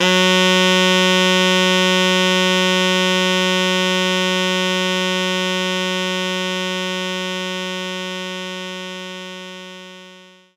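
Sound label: Baritone Sax A#3; knights try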